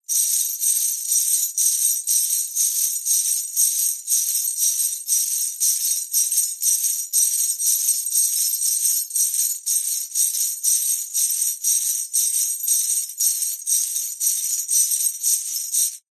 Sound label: foley keys large bunch prison guard walking jingle